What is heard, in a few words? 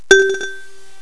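XYLOPHONE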